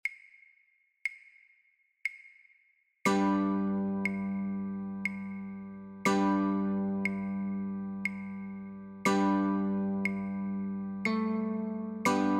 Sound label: Row Row Row Your Boat Notes Chords Claves, guitar; childrens